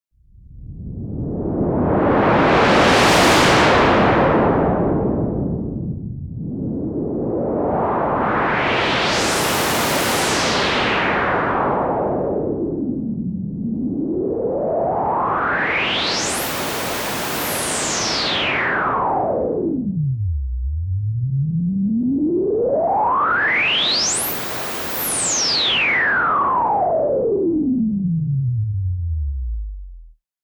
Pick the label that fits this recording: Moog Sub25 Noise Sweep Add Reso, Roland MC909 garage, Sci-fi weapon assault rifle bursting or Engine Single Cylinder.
Moog Sub25 Noise Sweep Add Reso